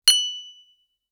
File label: glass clink; drinks